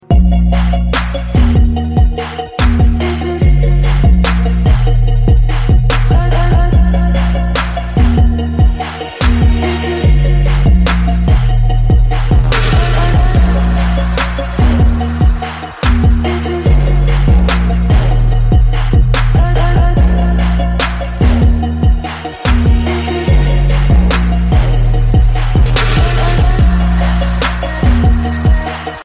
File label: remix